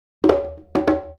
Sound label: Conga Fill; Essential Percussion